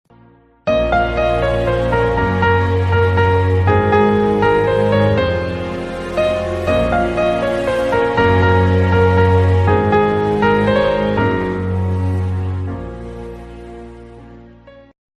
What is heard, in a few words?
Mom Sad Theme Song Ringtone; KGF